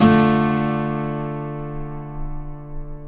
acoustic guitar chords; multisampled

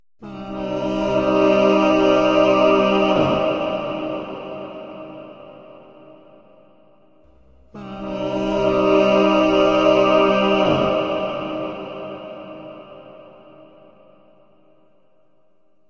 verby harmonized robot singing

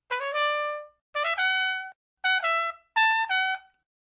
trmpt; Instruments

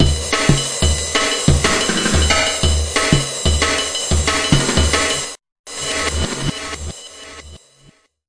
181 bpm, DRUM LOOPS and BREAKS; 181 bpm plus

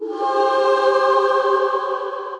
Layered Vox, Female Layered Synth Vox

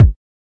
kik

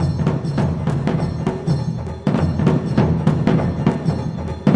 100 japanophile groover, DRUM LOOPS and BREAKS; 091 to 100 bpm

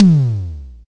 Simmons SD-5

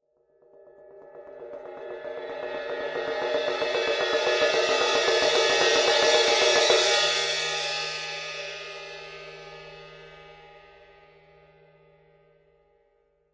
nice cymbal mallet role; Cymbals; single hits

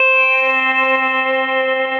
Synthesizer Middle C Notes, Lead

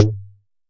Jomox MBrane, drum machines; Jomox MBrane 11

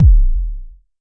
Jomox MBase, drum machine; Jomox MBase 01

